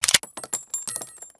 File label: 357 reload